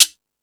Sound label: Percussion, Drum Machines E-MU Pro-Cussion